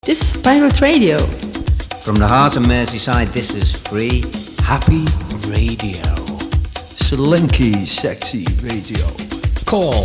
slinky sexy radio; Central Radio; Brian Grant

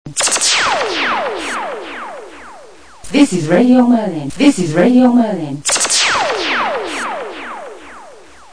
jingle; RMI

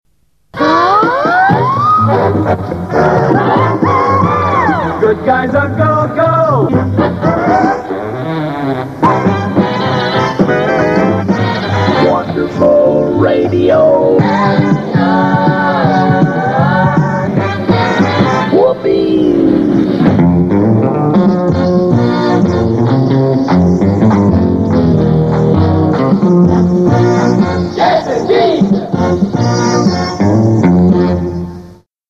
Jingles

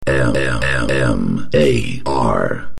Mmmmm Phased; Bill Mitchell MAR 105

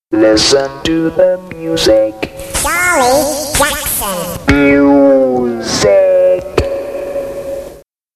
Jingles

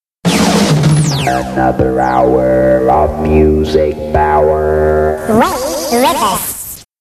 Jingles

